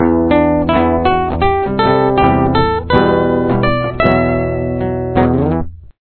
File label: violin